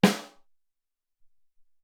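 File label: Solid Snare, Alesis SR-18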